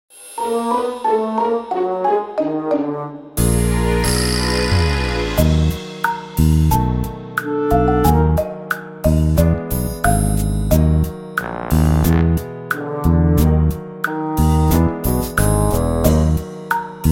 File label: Dungeon Failed; Omega Labyrinth Life